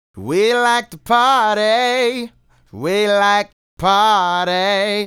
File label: Male Solo, Hip-Hop and RnB Vocals